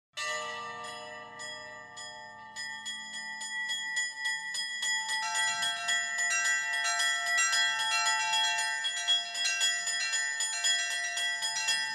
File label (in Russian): Пасхальный звон и стихира крестного хода